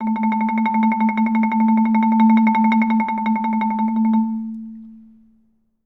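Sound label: TREMOLO A3, Orchestra; Best Service; Peter Siedlaczek's Advanced Orchestra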